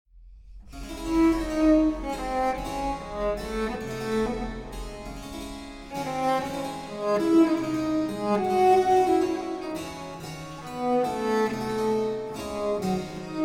Sicilienne Pour Violoncelle Baroque et Clavecin; Fesch

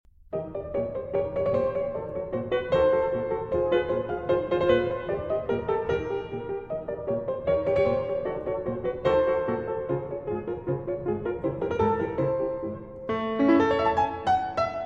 petites pièces enfantines pour piano, Staccato; Villageoises; FP 65

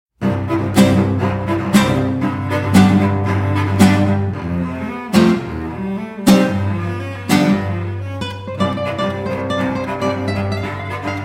Sonate pour guitare et violoncelle, Con spirito

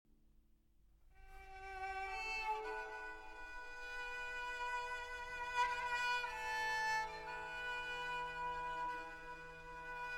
taksim & danse, kemençe & percussion, traditionnel d'Arménie

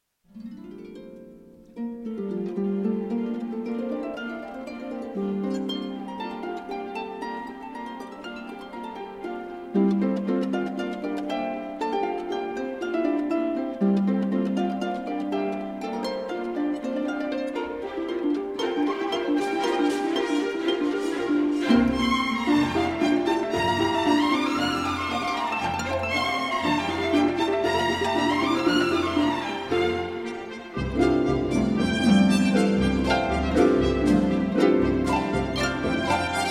Harpe et Orchestre; Concertino; 1927; Tailleferre

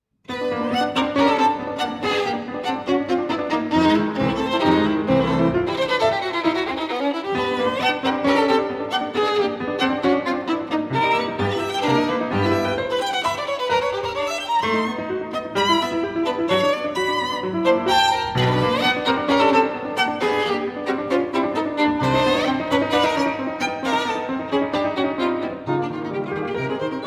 Sonate Pour Violon Et Piano Presto Tragico; Poulenc

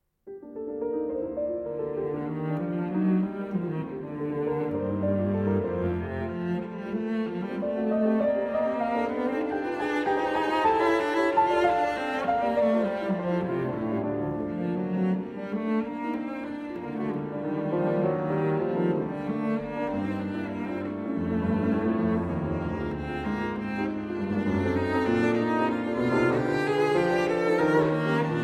Sonate en Sol Mineur, Allegro

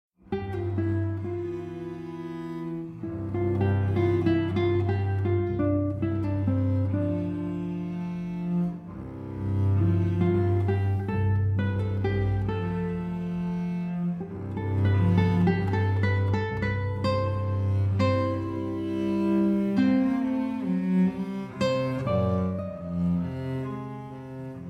Sonate pour guitare et violoncelle, Adagio

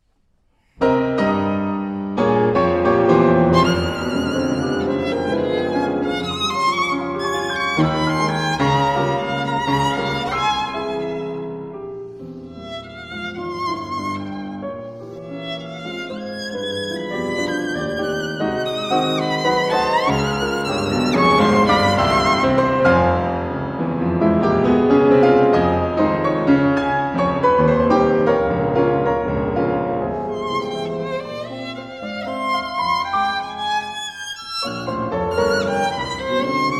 Sonate en Fa Mineur Pour Violon et Piano

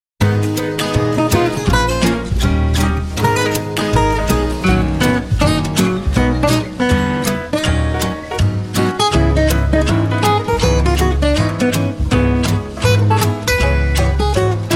Jazz Rétro Froid

